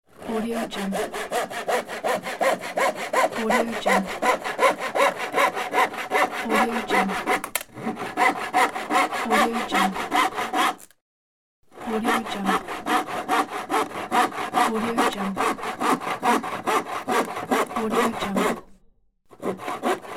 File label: sawing